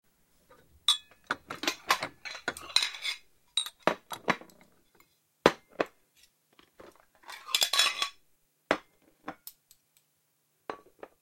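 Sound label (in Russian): звуковые эффекты посуды и палочек для еды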